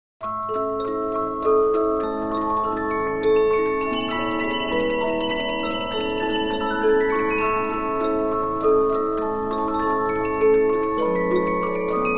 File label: Polyphon, 15inch disc musicbox